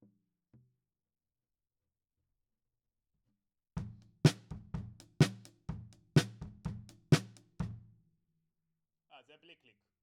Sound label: SNR Top